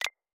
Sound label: camera click